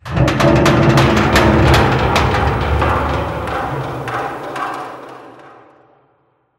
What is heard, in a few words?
ambient industrial metal ticks